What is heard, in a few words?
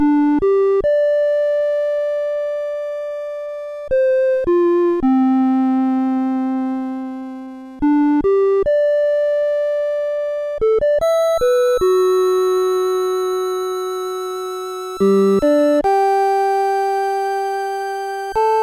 8-bit, CSG8580R5; 8-bit Civilizations